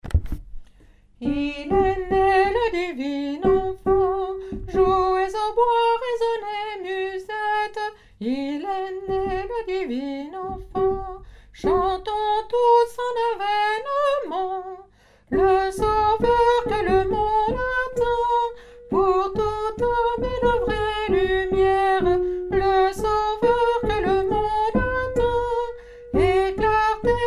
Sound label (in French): il est ne alto basse; nouveaux noels; chants noel